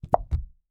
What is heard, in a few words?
Plunger Pop On Wood, Household